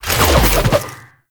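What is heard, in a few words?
Proj Hit; Enemies; Abilities; Warframe; Tau; Dax Ninja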